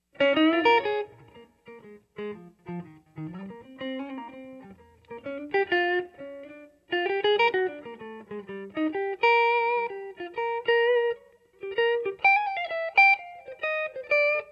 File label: Ragin Cajun Blues 335